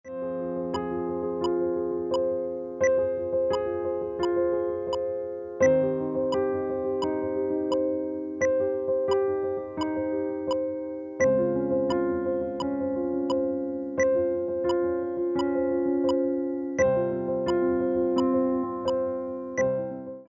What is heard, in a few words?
piano